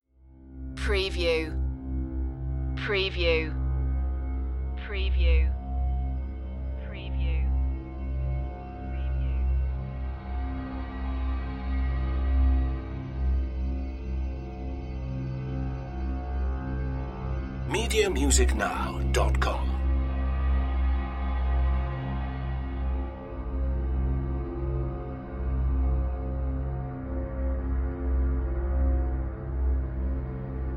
dark ambience

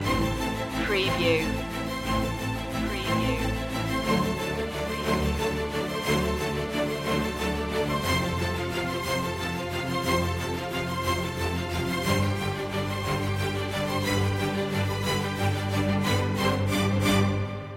strings only

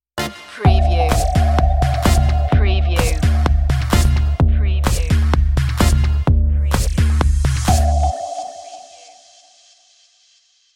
dub tek 808 stinger

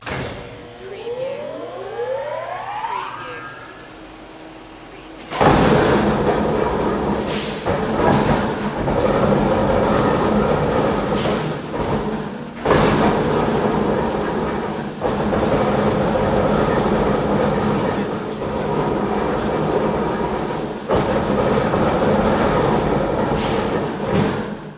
Press Type

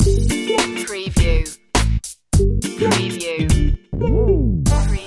lip service

